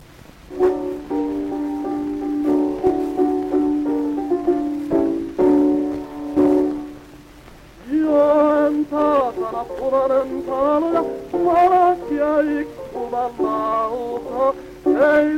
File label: Gramophone